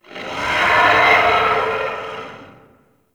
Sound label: Arcade Dino Laser Roar; Ambience; Props; Warframe; Nineteen Ninety Nine